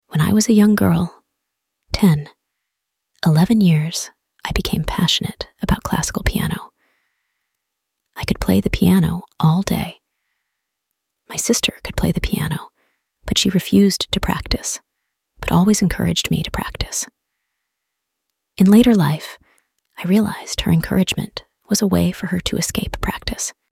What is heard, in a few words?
Piano